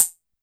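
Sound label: Hi-Hat Open Synth Short; YAMAHA RM1X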